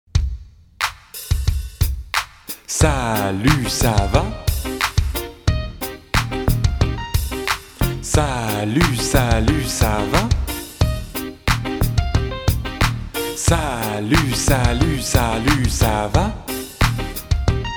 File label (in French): VERSION KARAOKE; Alex et Zoé 1